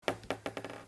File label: steel surface, bouncing balls